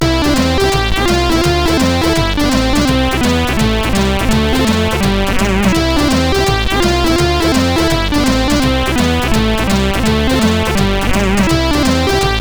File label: tune, CSG8580R5; Aaaargh